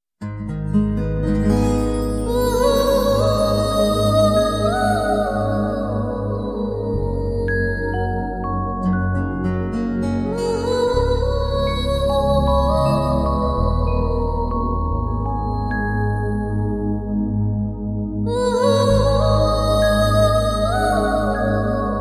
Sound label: facebook tones ringtone